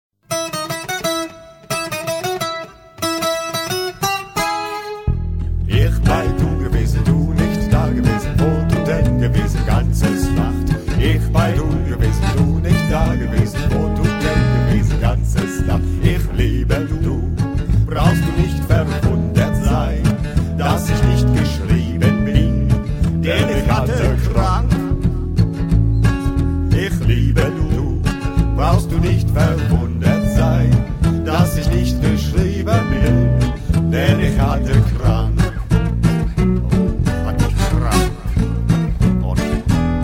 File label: KARAOKE VERSION